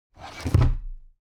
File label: foley office drawer cabinet crammed close heavy halfway